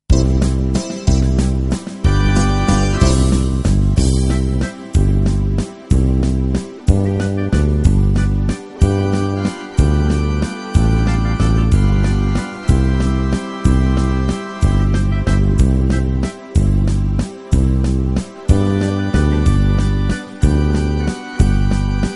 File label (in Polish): chatka instr